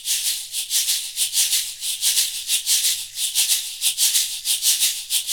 shaker, shaker loops; Roland LCDP14 Africa VOL-2

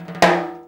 TIMB ROLL; Greytsounds VOL-1; SDX PERC

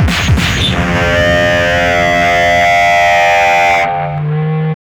WAR FX

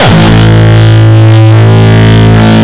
Longkick, kicks

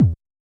BD Jungle, Yamaha DJX 2B

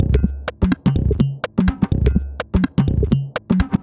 Top C 125bpm, House Drumloops

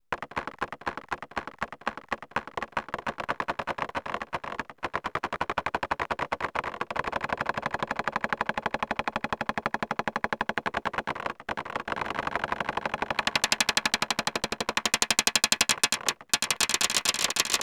tapping the glass; Graz

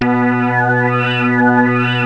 SWEEP, WAVE; SWEEP JOB; Rubber Chicken Software Sample Bank

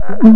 PERC FX, Big Beat; Big Beat Samples & More; AKAI

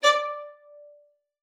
D5, VIOLIN SPICC; Xsample VOL-5

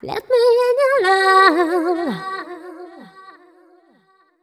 f 128 mel e, fem Vocals

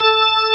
B3 FULL; Vintage; E-MU Formula 4000 Series Vol. 5; Protozoa; Vintage Presets

